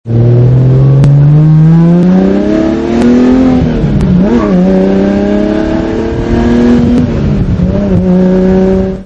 Ferrari 365 acceleration

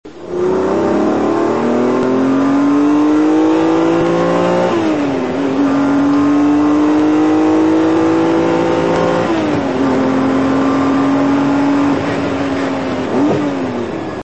Ferrari Testarossa interieur

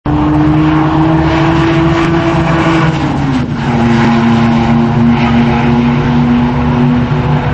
Ferrari 550 maranello passage

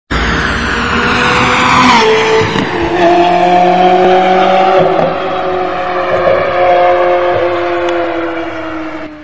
Ferrari 360 passage tunnel; Passage sous un tunnel

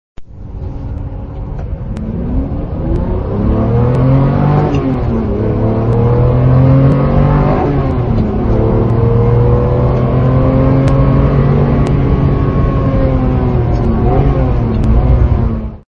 Ferrari 456 GT interieur

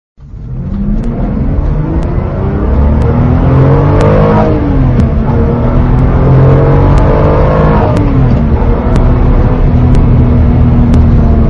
Ferrari 550 maranello interieur

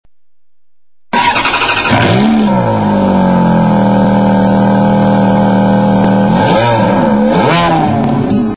Ferrari F430 demarrage

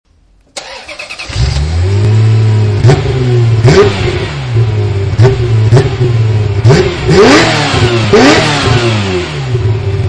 Ferrari F355 challenge point mort

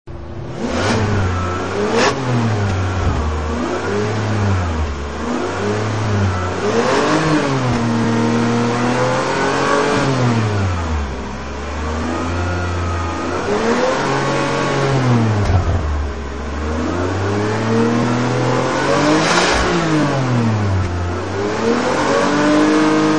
Ferrari 575M Maranello point mort, sons de moteur

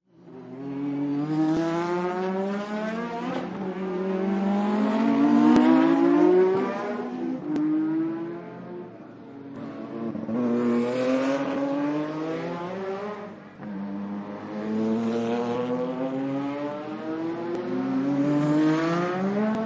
Ferrari F355 course

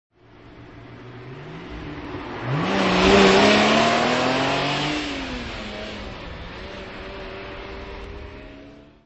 Ferrari Testarossa passage

